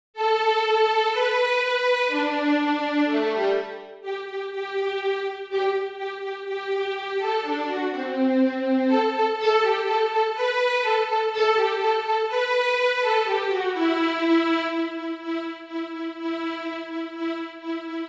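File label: Canon Violin 1; Bartok; For Children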